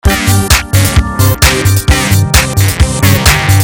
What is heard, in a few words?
Loop